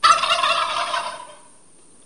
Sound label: turkey